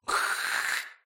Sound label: Visitor hiss